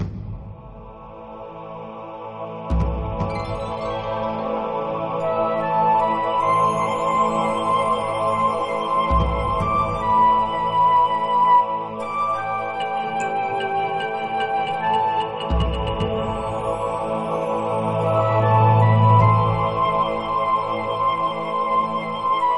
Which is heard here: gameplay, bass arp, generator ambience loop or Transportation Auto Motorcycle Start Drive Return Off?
gameplay